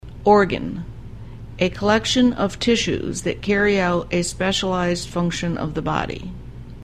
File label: organ